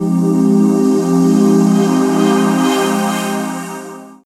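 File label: Egg Nogg C minor, Pads; Total Drum&Bass; 90 s Sample CDs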